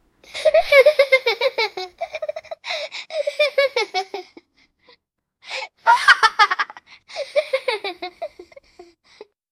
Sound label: the gentle laughter of a, person